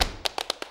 CLAP + STEP, MCLAPS+STEPS; Best Service; World Colours

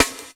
SD, 160 BPM; Ueberschall; Drum & Bass Flash Trackz

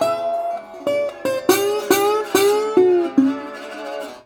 Blues Guitar Samples; ILIO - Fretworks

